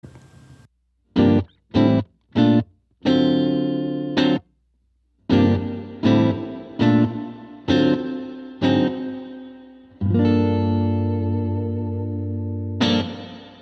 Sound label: Reverb; rolandmedia; EFFECTS 101: Reverb